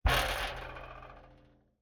Impact, bleachers, knocking, resonant, outdoors, pound, clatter; Eiravaein Works; Vaeyan IV